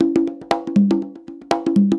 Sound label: 120 BG SHUF, PERC; Best Service Advanced Media Trax Modern Composer